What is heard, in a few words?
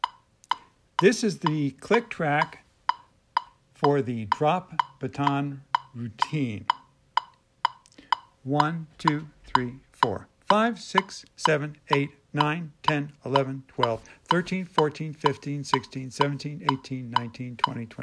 click track 76 beats; Dropped baton routine; Carson City Symphony - Hank Monk Schottische Project